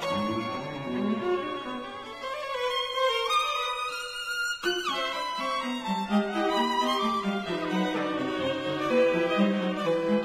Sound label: Quartet Euphometric; Cowell